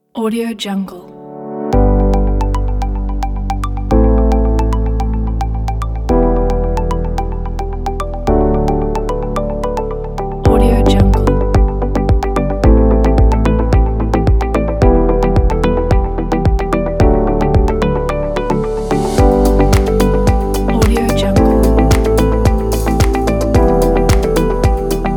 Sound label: motivational corporate